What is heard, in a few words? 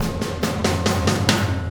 Impact Crater Beat, Processed Drumkits